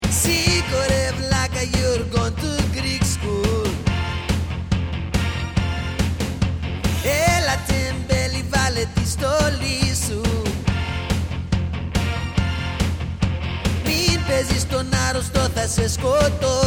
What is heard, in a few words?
Greek School